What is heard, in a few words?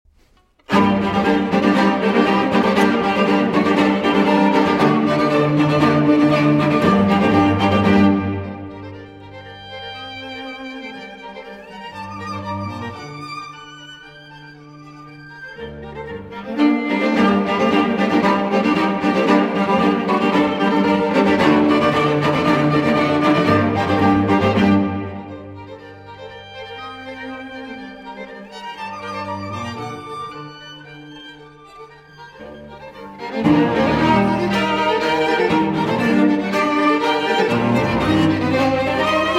String Quartet in D Minor, II. Variation 1